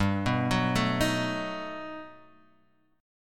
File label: G Augmented Major 9th G down Guitar DADGAD